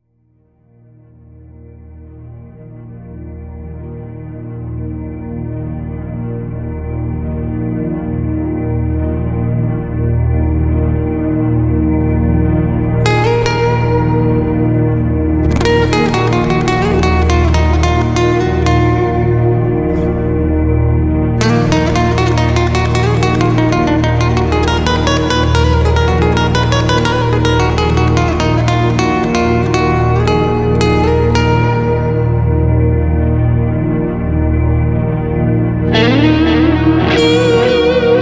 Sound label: rain forest instrumental